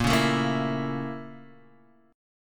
Bb Minor Major 7th Flat 5th, Guitar, Half Step Down; BbmM7b5; strummed; JGuitar